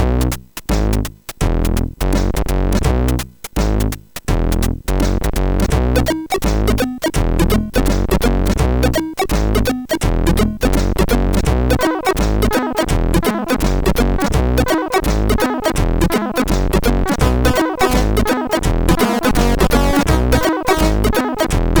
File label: Pianowork, MOS6581R4